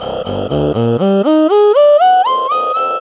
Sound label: "hmmm"